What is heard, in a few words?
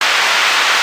rain